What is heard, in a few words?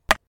Bat Swing Wrong Hit; Bugs Bunny - Batter Up!